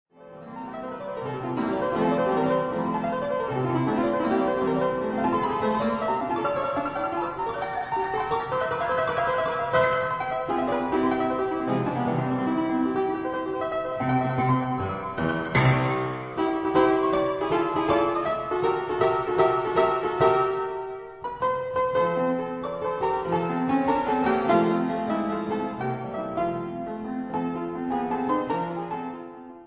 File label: Keyboard Sonata in B flat major, Allegro spiritoso